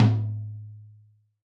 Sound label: tom b4